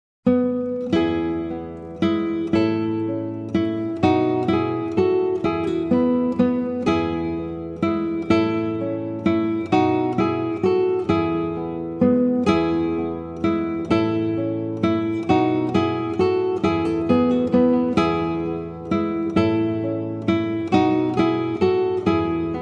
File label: acoustic praise, instrumental